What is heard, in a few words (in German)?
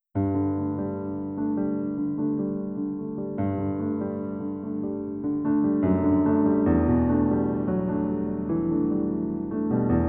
Gesang (DE)